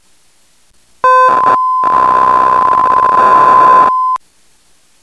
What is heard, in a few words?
bounce room